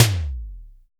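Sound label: IMPACT TOM; POP-ROCK1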